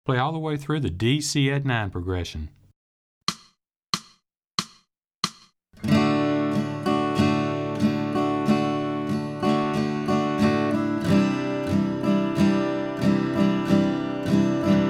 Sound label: D Cadd9 Progression Slow